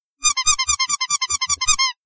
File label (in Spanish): patito de goma